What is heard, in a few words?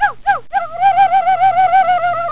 WAR CRY; PEOPLE